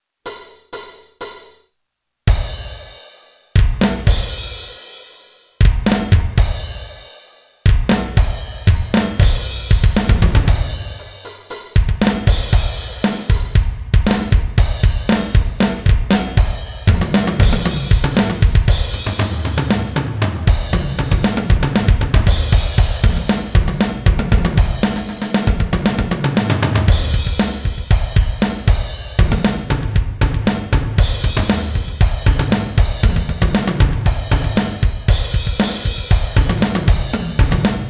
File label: music drums; peter piper pizza